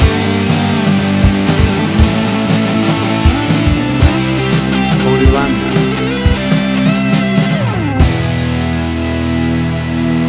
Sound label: 80s Street Rock